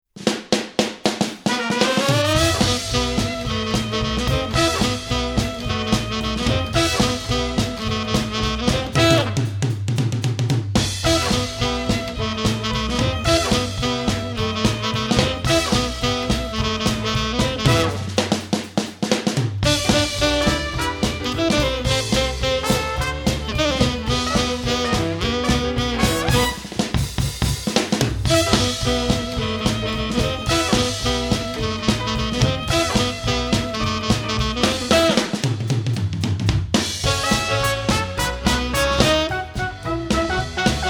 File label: Entract instrumental